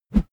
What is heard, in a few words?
whoosh bamboo; swooshes-swishes